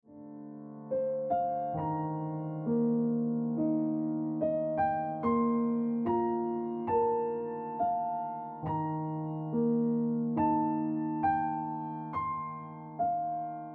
Piano Music

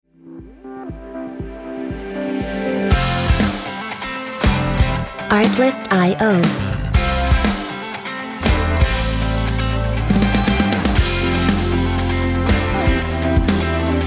Falling Ringtone

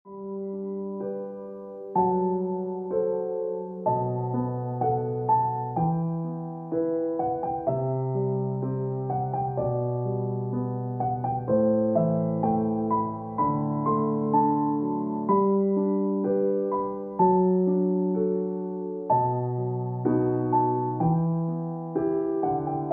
Piano Music

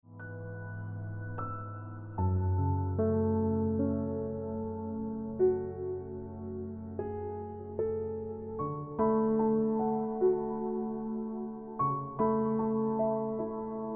Into The Woods Ringtone Piano Music

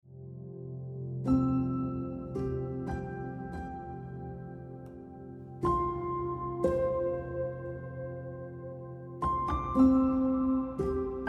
Just You Ringtone Piano Music

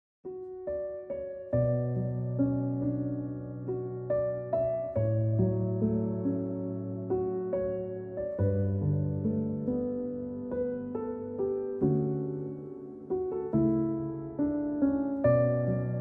Piano Music

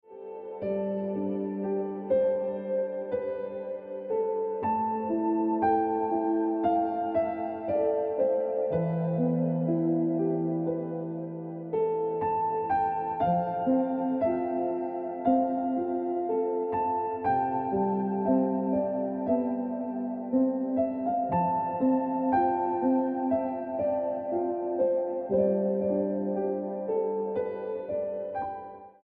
Ringtone Piano Music

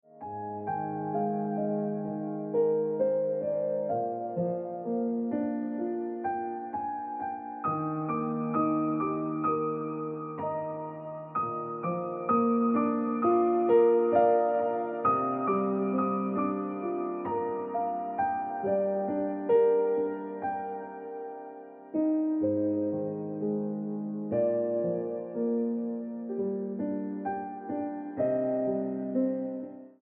Like A Radio Song Ringtone Piano Music

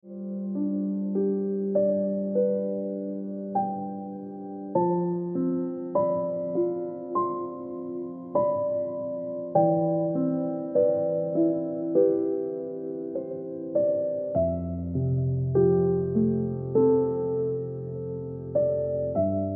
- Instant Ringtone Piano Music

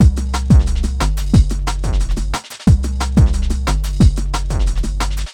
Msg Tone Ringtone

